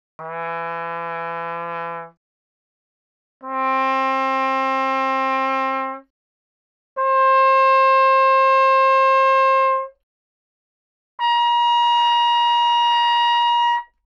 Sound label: Trumpet; 気鳴楽器; LipReed; Trumpet+Cornet